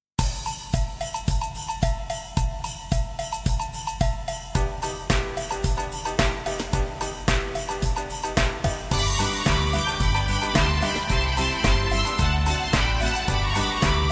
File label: Jingle Bells